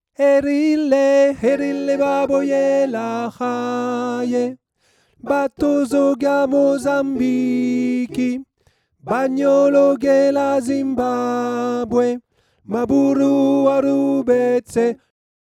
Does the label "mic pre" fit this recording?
No